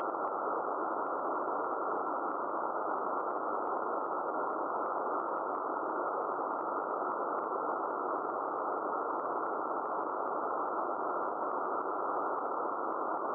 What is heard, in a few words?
background noise